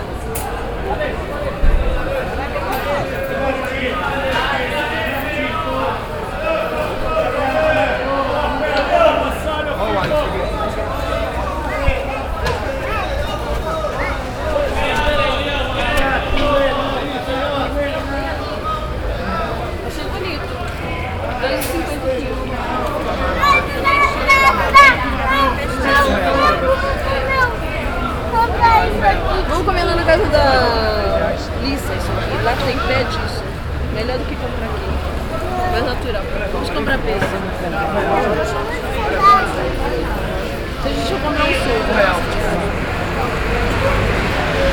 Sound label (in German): aufm markt, Brasilien